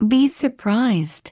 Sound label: be surprised